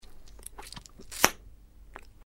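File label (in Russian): надувание пузыря и поп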